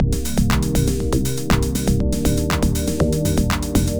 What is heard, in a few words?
music unprocessed